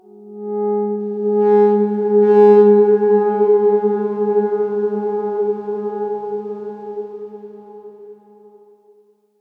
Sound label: Darkswarm G#3 f; healing soundscapes; Ableton